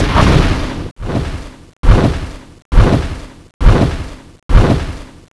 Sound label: attack special; CSO Cronowing; cronobotics54